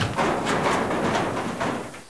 grabs Rat from Cage; DR. BRAIN

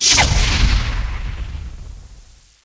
firebal; duke nukem total meltdown

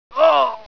ouch; Macworld (1998-04); Paper Air Force LE

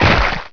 tent, hydra; HEXEN2

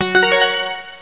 end button rally; C.D. Caterpillar; Caterpillar Sounds

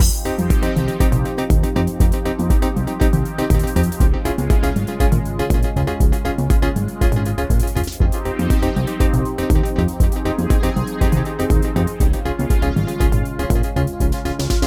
techno loop

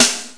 SD Rock Tim; GMPlay; XG

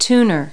tuner; Multi-Lingual Talking Picture Dictionary

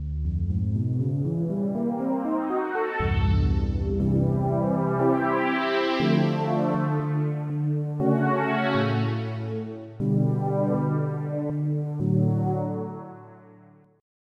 Sweep Pad; EverQuest

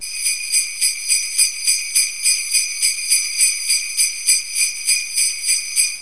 slidbell

